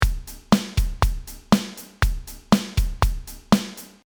Rythme rock

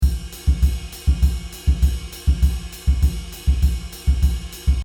rythme jazz samba GC HH Ride

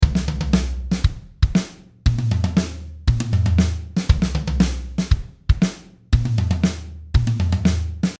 Rythme, batteur

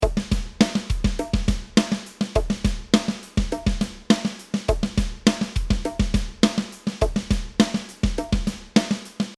mise en place main ghost notes 103bpm

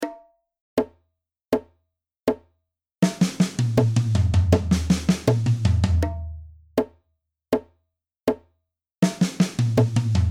Fills Niveau 2 Ligne 3 80bpm

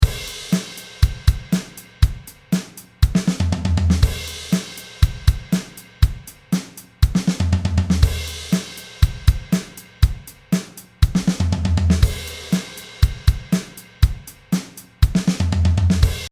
fill simple, batteur

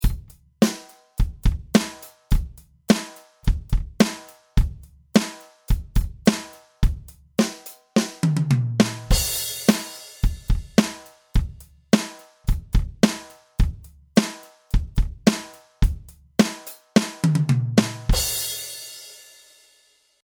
rythme

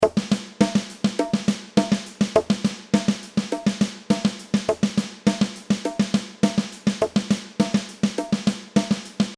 mise en place main 103bpm

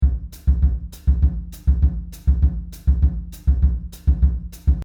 rythme jazz samba

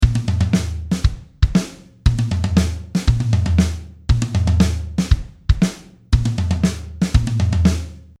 Rythme

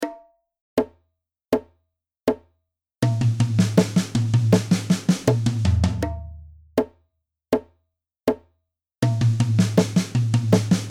Fills Niveau 2 Ligne 3 80bpm

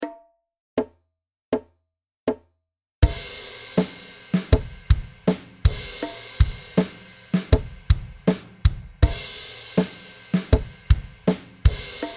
Refrain 80

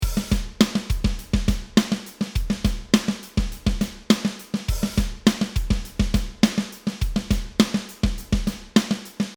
mise en place main ouverture charlé 50bpm, batteur

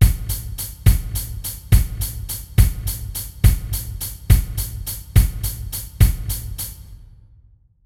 triolet

